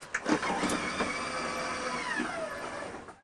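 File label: front door open, volvo 9900; Freyfurt Beta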